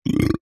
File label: human male burp short deep